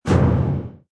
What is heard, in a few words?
Error; Conquer Online 2.0